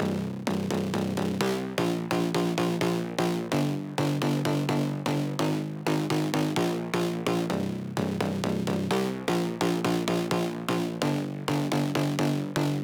128 BPM C Min Bass; House; Drop Loops; Cymatics; Pastel Rain

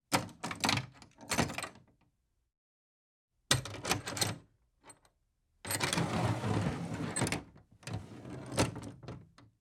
Metal Door Latch Movement; Fairlight Sound Lib

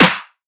Riddim Snare Clap

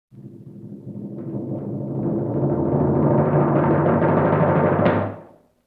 LoFi Timpani Roll; Vintage Cartoon; Drums & Percussion

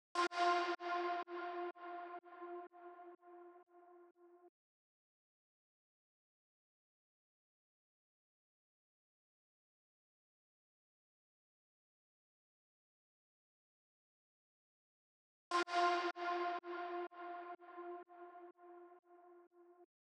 125 BPM A# Min Accent, Melody Loops; Loop Stems; Cymatics; Antidote

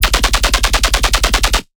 Bass Shot F, Dubstep; One-Shots; QuackAttack; Ghosthack Advent Calendar 2021 - Day 16 - Dubstep Basses

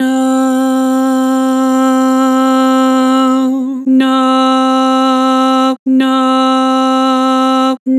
Vibrato "No", Harmor Vocals

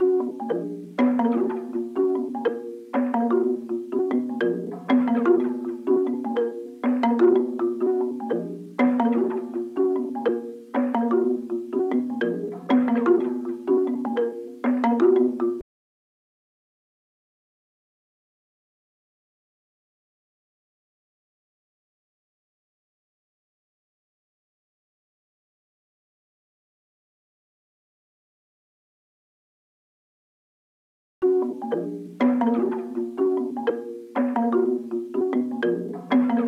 123 BPM A# Min Mallets; Loop Stems; Cymatics; Completely Falling Apart

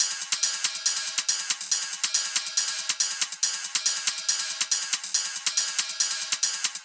Detonate Hi-Hat Loop 140 BPM, EDM; Drums; Loops; Top; Cymatics; EDM Starter Pack

